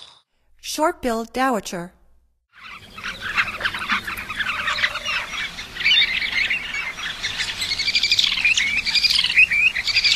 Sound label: Short-billed Dowitcher; North America; Stokes